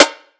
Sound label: drum snare breaks; Virtual Riot; Drum Hits